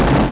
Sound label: boom